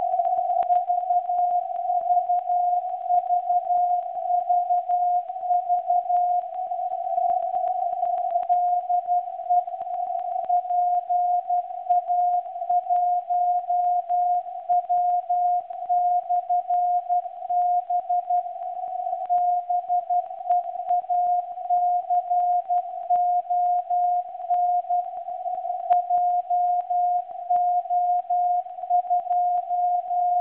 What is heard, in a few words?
websdr twente start 3579.1kHz, Beacon recordings